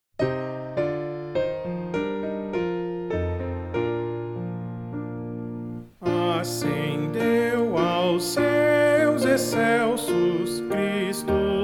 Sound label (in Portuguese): voz piano